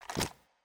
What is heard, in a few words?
open inventory